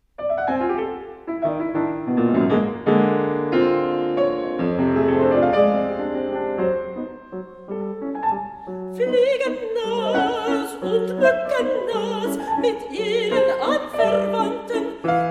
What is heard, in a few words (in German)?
Orchester Tutti